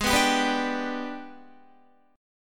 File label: Ab Minor Major 7th Flat 5th, down, Guitar; strummed; AbmM7b5